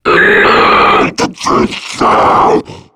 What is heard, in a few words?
charger alert; cs16; zombie plague